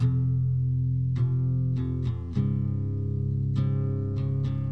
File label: bass, Loops, Sad